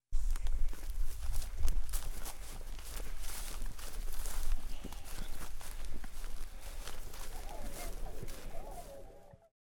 Walking Through Grass Imitating Deer

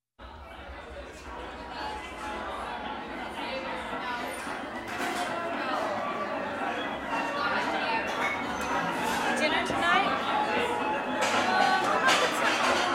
Circus Pub

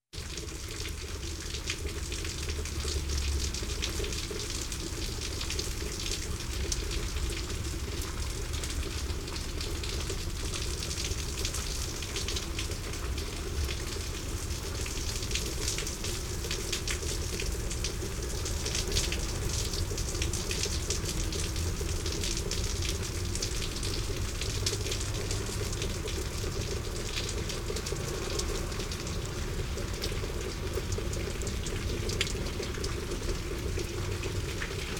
Rain in an Urban Atmosphere

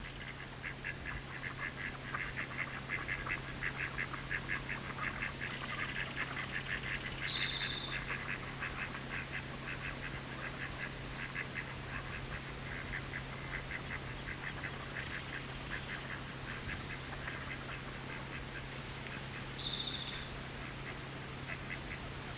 Rhythmic Ducks

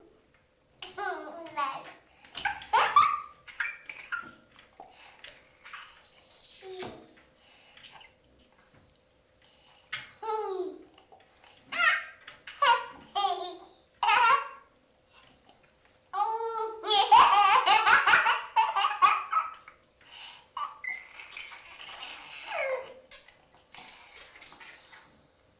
Delighted Baby

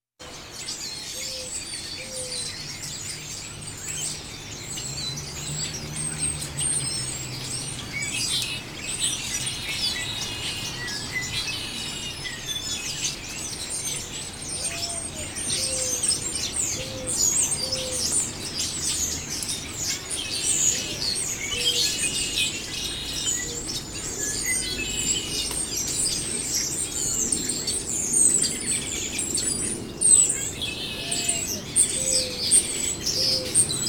Blackbirds Lullaby Near Road

